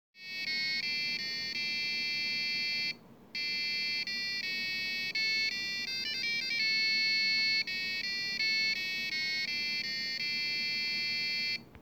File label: Buzzer Passive; MOD-3